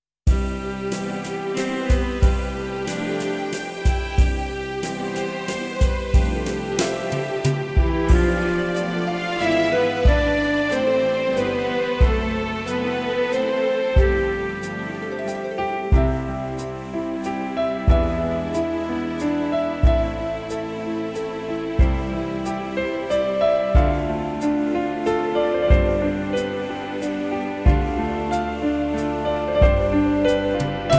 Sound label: waltz, ballroom